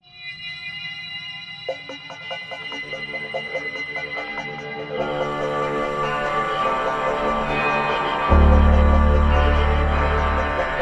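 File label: high tensile, roundwound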